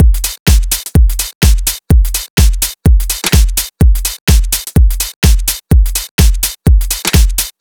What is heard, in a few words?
126BPM Drums; Vengeance Samples; Tech Freak Kit